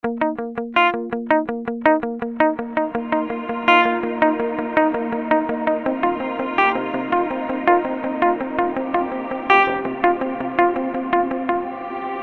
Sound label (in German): Gitarre Funk Wobble 2s Pre Delay